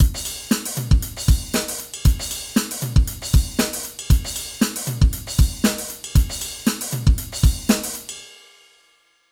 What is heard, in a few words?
Alesis SamplePad Pro